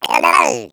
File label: egg bot tractor beam; Portal 2